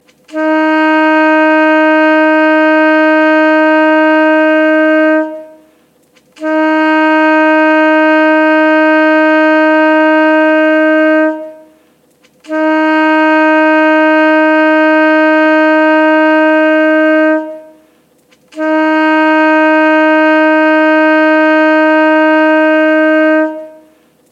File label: alto sax c